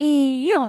Eeuh; Neru; SM64 CO-OP; Triple Baka Pack

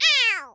attacked; yoshi; SM64 CO-OP

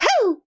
yah wah hoo; birdo; SM64 CO-OP

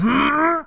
hrmm; foreman spike; SM64 CO-OP

